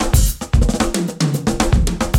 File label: tr7 bass